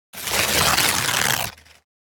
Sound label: Tear paper slow